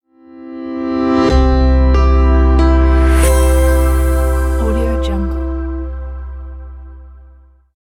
corporate piano logo reveal

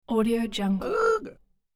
kalooga vocal horn; sound-ideas

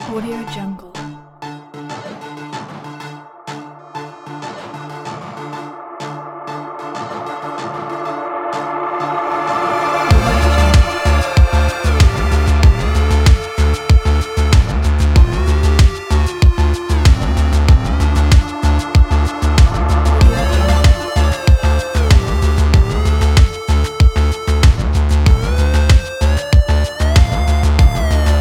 chiptune city

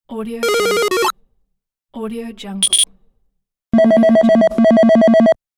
retro 8 bit game

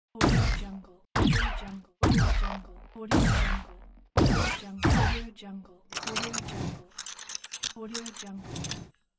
laser gun

